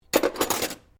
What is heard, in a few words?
cutlery general place drawer, Household cutlery; Kitchen